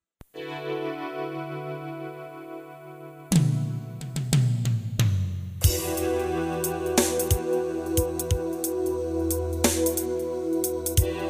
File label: Ballad